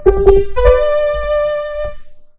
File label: Fanfare; Artemis 2.7; Battle of Elihu 12